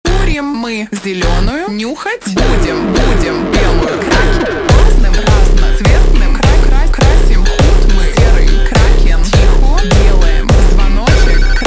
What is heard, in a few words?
Hardstyle slowed